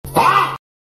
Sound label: faah